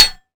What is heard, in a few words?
metal small